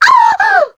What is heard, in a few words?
succubus hurt strong; queen girl